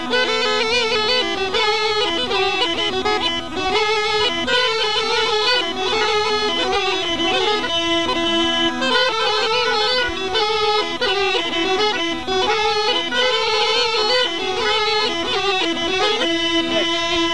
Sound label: Sitnije, Rodope Gajada